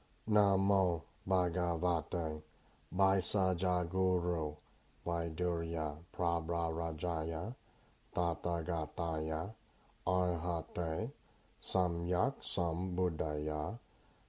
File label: Medicine Buddha Dhāraṇī